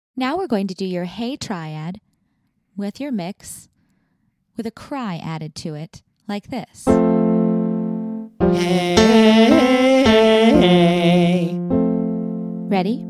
Mix Hey Triad Cry

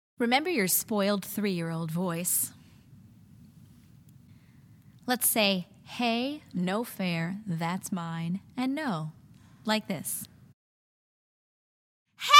Spoiled Three Year Old

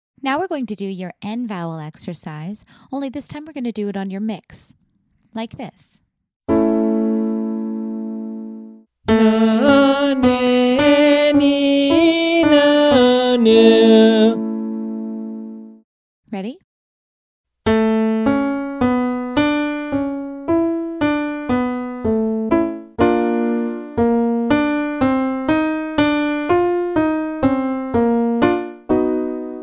Mix N Vowels

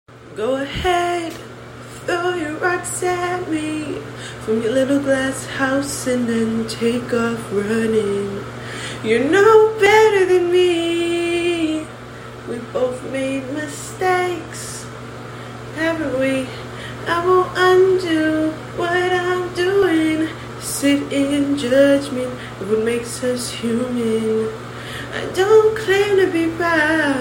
singing

